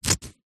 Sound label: foley scissors cut cotton underpants single cut; zapsplat